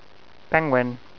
Penguin; Penguin Registration Numbers

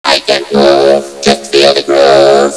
GROOVE

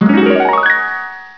Harp; Hyper Stacks; Pacific HiTech; Science; Chemistry; Thermo

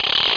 ratch

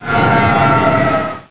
FireTruck; Sim City 2000 Demo

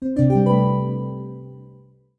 New Mail; Outlook Express 5.02; Microsoft Sound Set

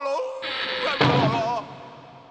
opera; The Interactive Alphabet

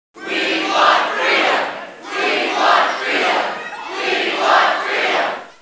freedom, crowds, chants; KABOOM! - Wild and Wacky Sound Software

